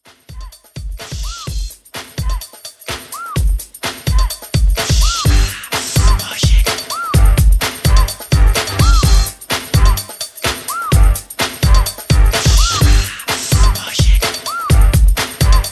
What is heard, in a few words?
90s Re Drum Freestyle 127 CLEAN